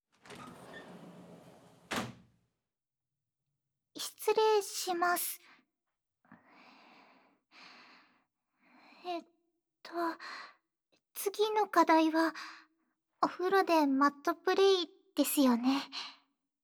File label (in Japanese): お風呂でご奉仕 マットプレイ